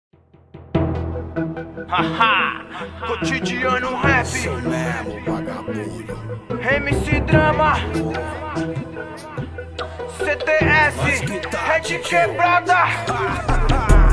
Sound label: rap